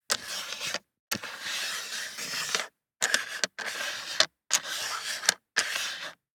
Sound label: DICE on Plastic, Slide, Standard, 4 Four Dice; Samples; ArticulatedSounds; DICE Sfx Party (Extended)